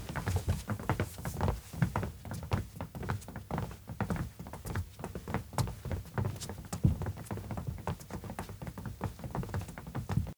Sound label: steps indoor hall walk kids; anemoi パッケージ版 1.1